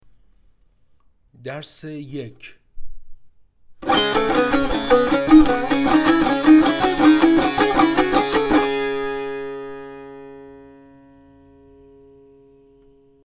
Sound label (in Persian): مشق تنبور; تنبور جلد دوم; هفتاد و دو مشق تنبور